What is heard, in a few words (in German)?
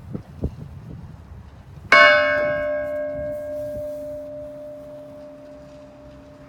Klang der Glocke des Schlosses Winsen